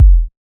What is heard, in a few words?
Kick Pure